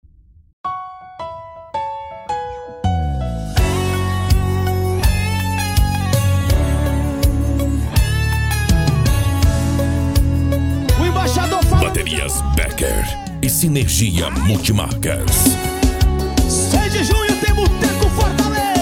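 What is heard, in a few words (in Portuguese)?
especial sertanejo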